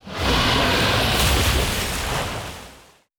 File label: Mage Skill